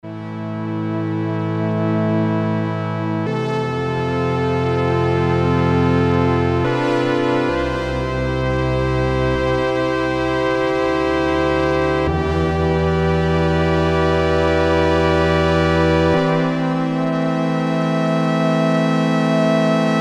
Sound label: STRINGS, Oberheim OB-8; Factory Patches